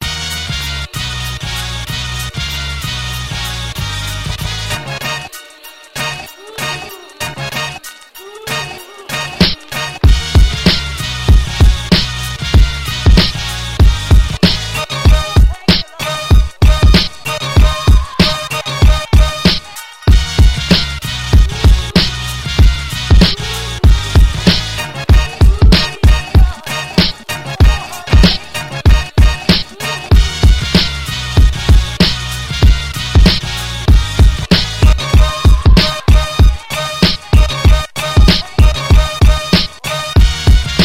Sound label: beat